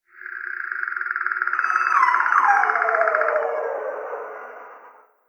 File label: rapt click long